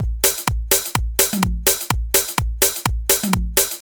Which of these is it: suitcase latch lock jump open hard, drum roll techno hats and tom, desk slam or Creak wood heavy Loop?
drum roll techno hats and tom